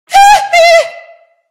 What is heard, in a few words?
hee hee